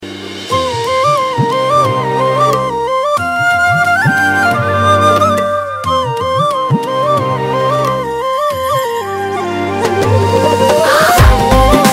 Flute Bgm Ringtone; Kanaa; Tamil

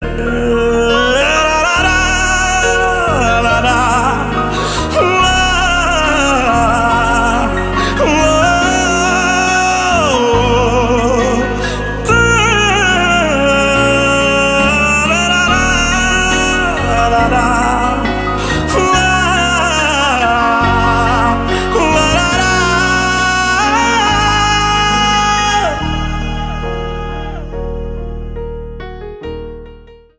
Humming Tone

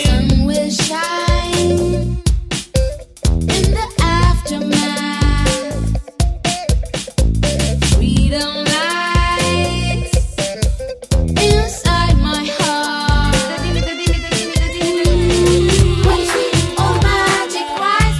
BG Humming Tone; Pelli Choopulu; Telugu

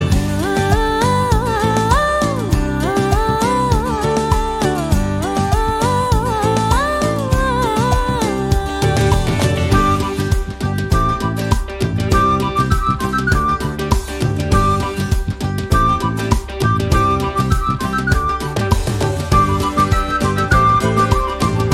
Humming BGM Ringtone; Tamil

